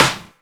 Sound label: Snare